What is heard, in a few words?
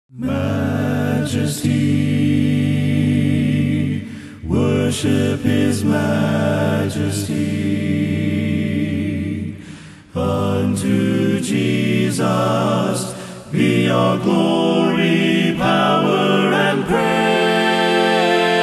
A Cappella